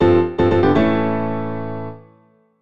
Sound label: Piano; The Legend of Zelda Ocarina of Time